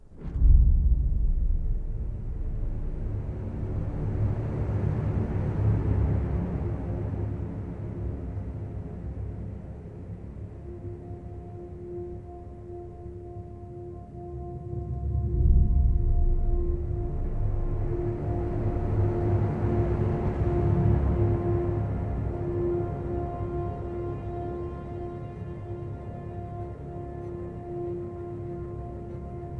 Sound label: strings heavy, Sad Loops